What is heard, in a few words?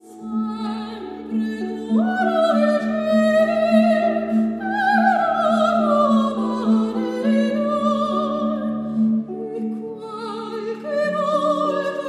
cadences